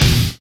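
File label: guitar (heavy hit); Sonic Foundry (Sony Creative Software); Ugly Remnants VOL-2; Single Shots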